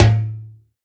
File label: Perc; Uppercussion Conundrums